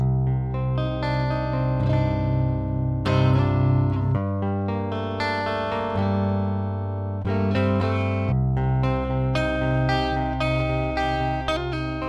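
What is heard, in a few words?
metal machine neck clean, guitar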